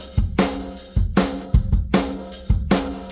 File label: drum loop 4 bar 4-4, 154